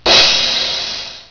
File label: CYMBAL